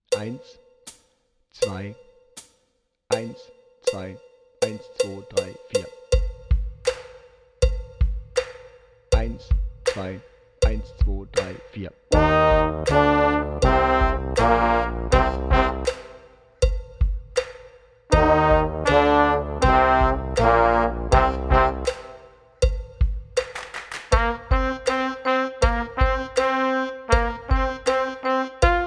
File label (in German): Power Rock Playback ohne drums